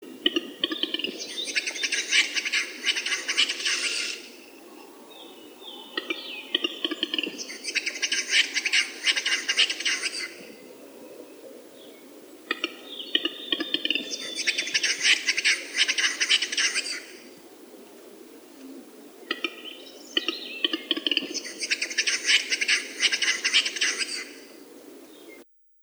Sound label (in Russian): Gluhar